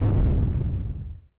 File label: explosion; robobombo